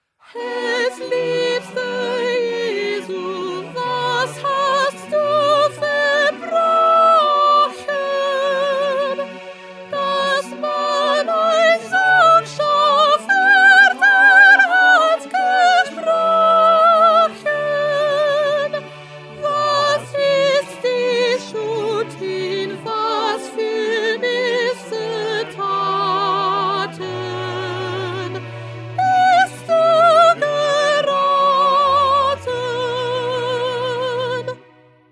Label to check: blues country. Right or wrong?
Wrong